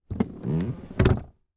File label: Transportation Chevy Trailblazer Adjust Seat